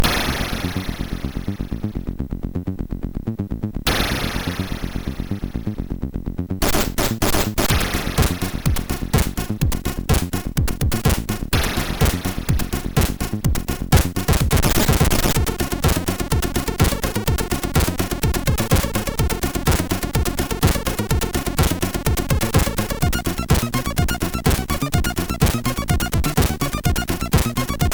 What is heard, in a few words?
Note Song, MOS6581R4; hvsc